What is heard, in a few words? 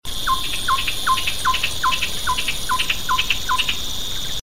striped tit-babbler